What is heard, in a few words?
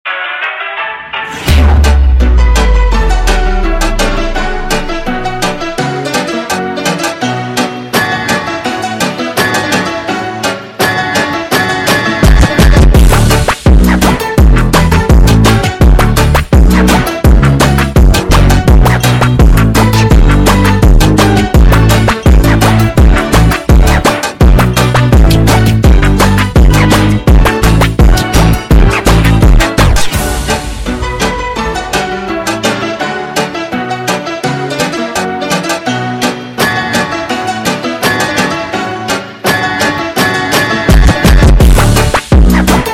Funk slowed Instrumental